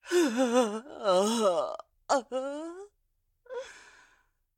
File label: Agony Pain Standing Still; Zara